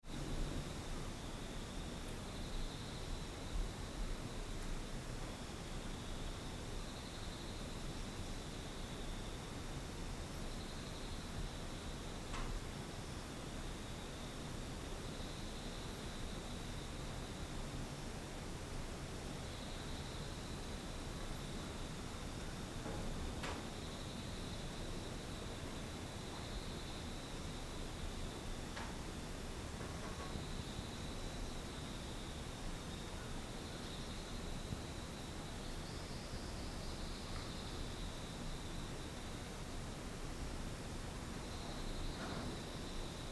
pastoral landscape

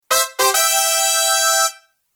comical fanfare

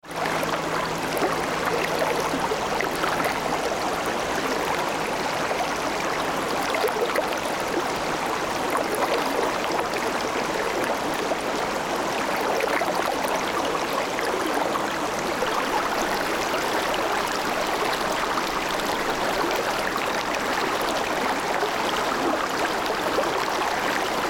river

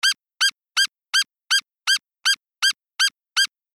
comical footstep

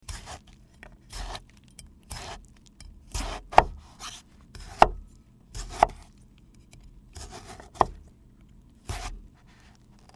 tomato cutting

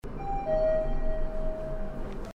elevator

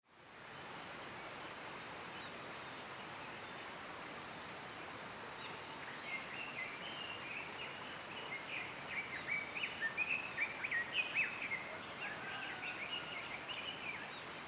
morning countryside

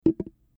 koto

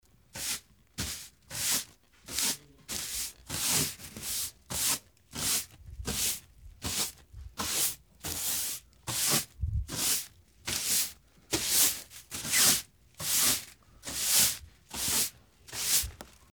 broom

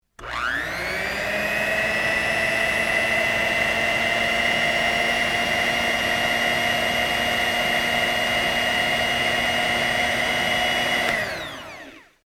hand mixer

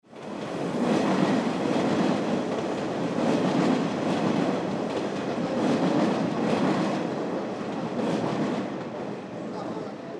train

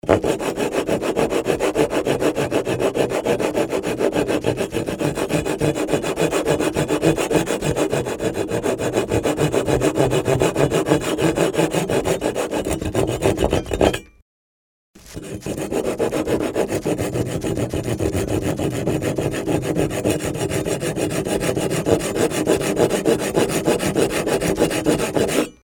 handsaw

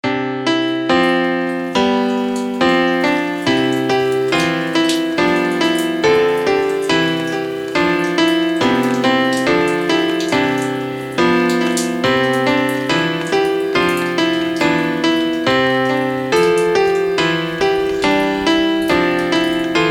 MUSIC classical

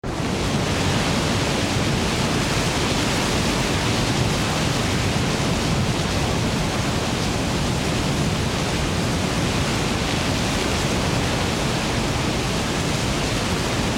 Japanese landslide

